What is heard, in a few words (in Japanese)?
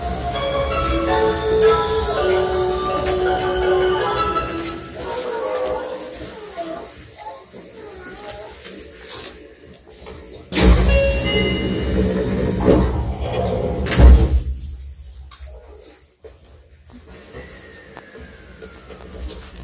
西武池袋線 清瀬→東久留米